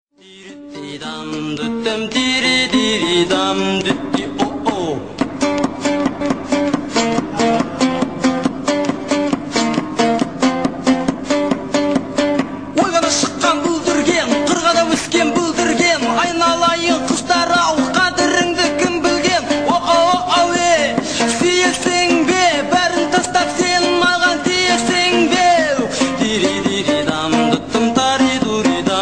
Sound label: Crazy Kazakh Music